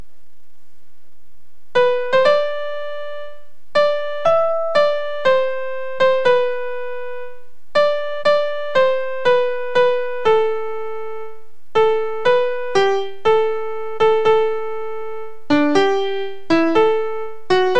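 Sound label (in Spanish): Sopranos, MIDI; Come Again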